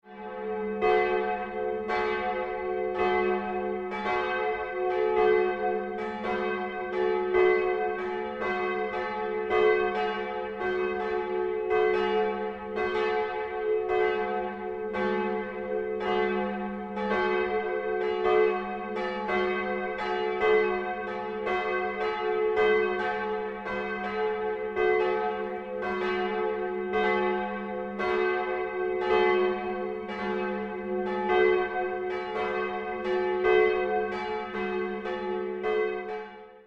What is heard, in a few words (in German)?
Glocken; Wellen